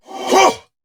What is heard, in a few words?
Attack Effort